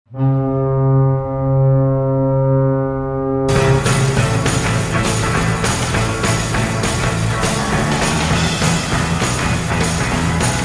horn; Blue jays

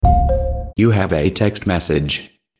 Simple Sms Tone

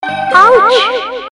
Ouch Sms

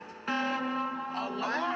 Alert; The Circle

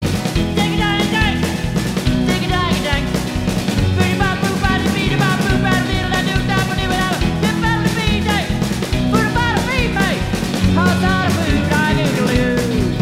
Closing Music

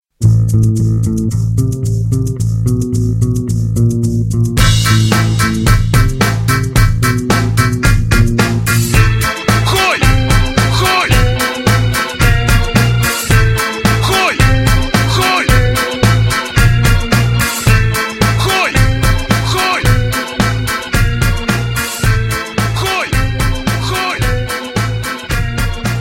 Cool Rock Ring

